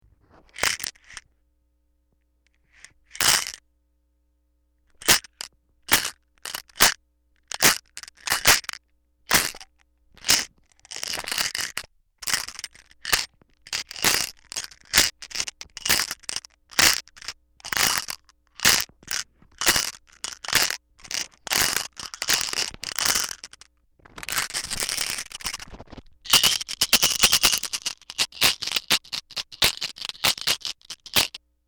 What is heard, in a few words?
copper ball bearings